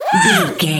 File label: goblin fairy attack